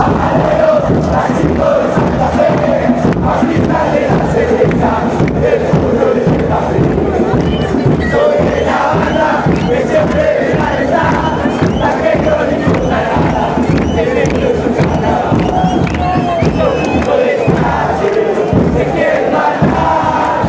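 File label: run, football chants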